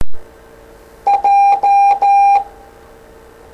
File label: Morse J